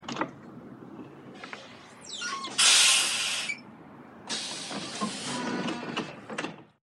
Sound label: door